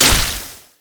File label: basicattack; LoL; varus